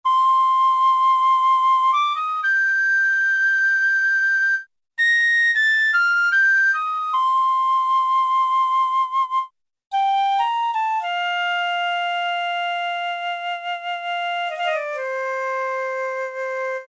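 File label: Flute Piccolos, Brass And Woodwinds; Roland L-CDX-03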